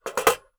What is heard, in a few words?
household safe small desktop metal put down on hard surface